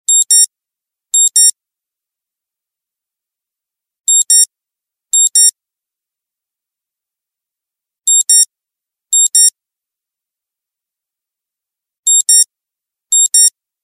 beep alarm ringtone

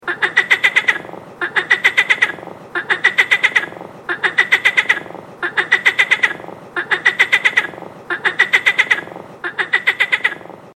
frog ringtone

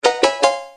sms tone

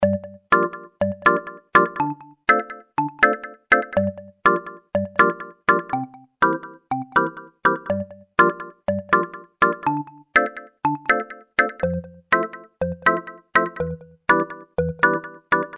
house music ringtone

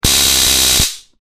taser sound

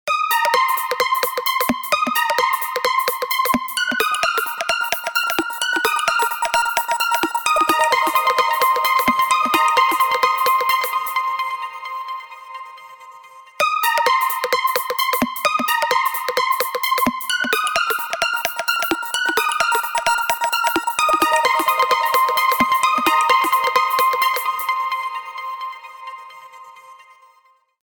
new electronic ringtone

